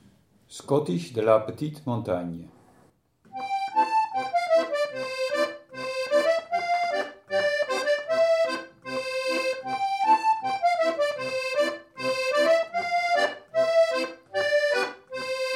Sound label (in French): scottiche de la petite montagne